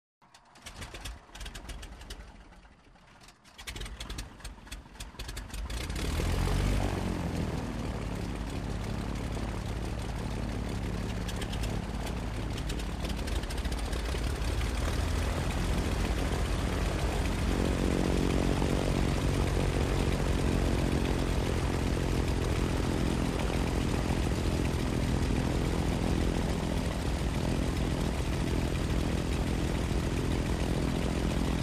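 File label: Motor Underneath Sporadic Popping. Idle With Prop Buzzing, Steadily Increasing Speed. Second Motor Revs Up, Harmonizing With First. Rev Down By Steps, Prop Plane; Broussard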